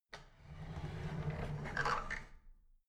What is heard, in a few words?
drawer big clatter open soft